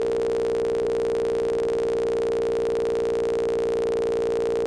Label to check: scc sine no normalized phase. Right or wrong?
Right